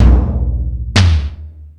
Toms, E-MU Pro-Cussion

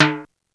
TIMBAL H, M1 DRUMS; East West; Global Sounds

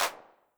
HAND CLAP, 909 Reverb Pitch Width

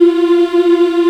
MMMS F 4; Spectrasonics; Heart of Africa 1

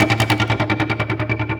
LOOP FX, Drum & Bass, 150 BPM; Ueberschall; Drum & Bass Resonance

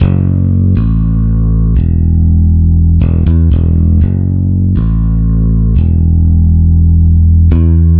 Bass Guitar 120BPM F#m; 99Sounds x Monosounds; Instrument Loops

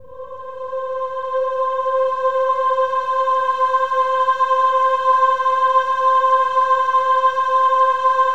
OH-AH C5 R, CHOIR; Spectrasonics; Symphony of Voices

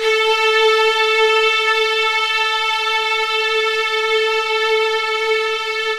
Cream Pad (single note)

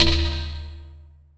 PERC, 170 BPM; Ueberschall; Drum & Bass Flash Trackz

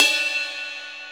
Cup, Yamaha RX5 drums

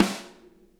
snare, drum kits

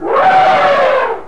ANIML; Zero G; Man Machine

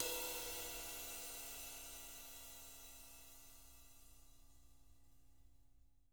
rides

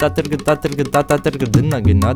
INDIA R, Heart of Asia, IND ENS; Spectrasonics - Heart of Asia CD2

